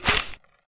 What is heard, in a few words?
Lunge Hit